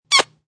Quick Squeal Kiss; Kiss sound.; Kissing

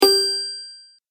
Ding Attention Bell; Bell Sound Effects; musical sound effects

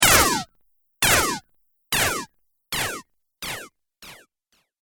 Laser Delay, sci-fi laser sound effect; Laser Delay Fx

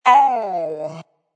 Vocal Deep Ow, Funk vocal loop sample.; Loop Vocal Deep Ow1 110bpm; Music Production Loops

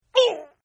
Grunt; Partners In Rhyme

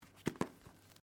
Book Pickup; Book movement; Offices

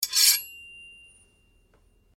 Blade Scrape Fast Ring, Sword; Foley sounds for melee weapons; Swords (Sound Effects:Combat)

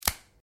Branches Dead Snap

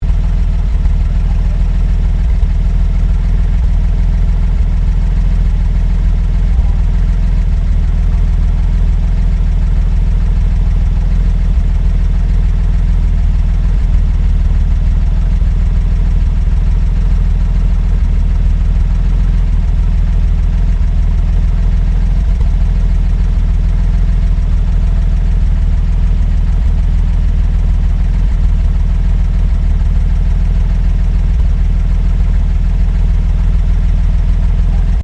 Truck Bedford WS 1942 idle exhaust loop; Classic commercial vehicles; Trucks

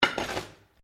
METAL ON GRAVEL IMPACT GENTLE; Materials

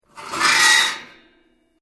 Metal plates scrape; Materials; Foundation

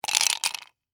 Whiskey Glass Ice, Into; Foley; Drink Mixing

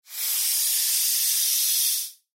Sci Fi Alien Bug Hiss

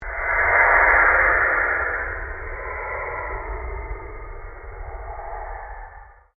PULSE LONG SWEEP; Sound effects created by manipulating other sounds; Sweepers; Sound Design

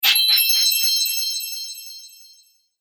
Coin Magic, magic sound effects

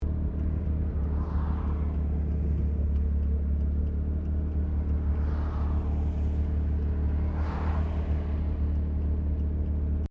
CAR MAZDA RX7 SERIES3 INTERIOR IDLE INDICATORS; 1983; Cars; Vehicles

